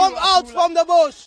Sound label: charge, Voices Emotes